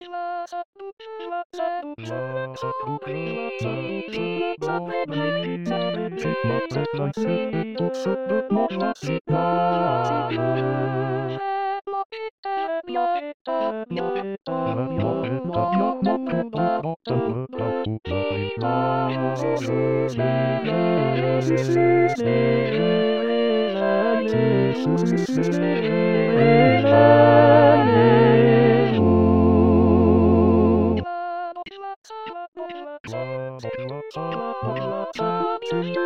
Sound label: tutti; Gevaert; Les Voisins